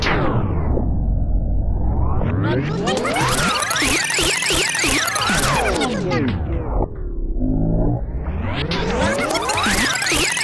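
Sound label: TAPE rewind fast forward, DJ NOISES; Spectrasonic Distorted Reality 1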